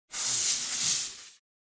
Futuristic door air pressure build and release

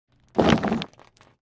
Heavy chain dragging on floor

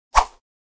Whip swish quick

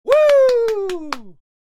Man applause and cheers

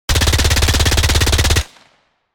MAC 10 submachine gun short burst; war

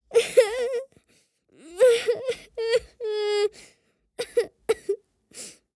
Young boy sobbing desperately

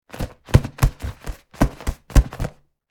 Inflatable beach ball taps hand hits

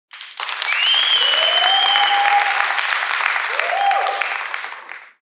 Sitcom audience applause and cheers; crowd

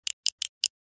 Torch flashlight turning on and off

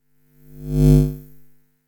Multiple lamps and cables flowing energy whooshes

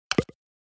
Cartoon pop

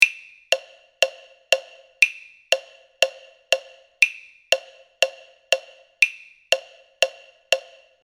Wooden metronome 120 bpm

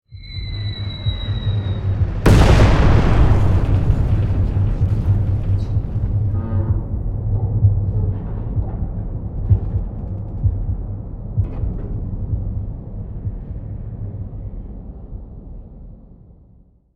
Nuclear explosion deep rumble massive bomb

